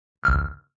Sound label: Short Ring